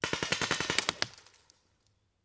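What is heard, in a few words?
Paintball Gun Impact; Sound Ideas; Guns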